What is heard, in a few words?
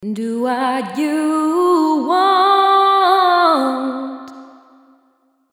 reverb